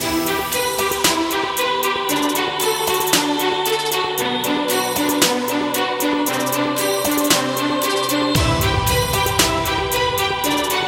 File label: Trailer Hip Hop